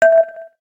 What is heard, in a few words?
electric zero, keytone; SKY IM-A850S-VEGA-R3